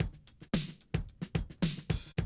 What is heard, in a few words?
110, Classic Drum Loops